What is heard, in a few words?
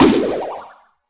cake; HeavenStudioPlus; blueBear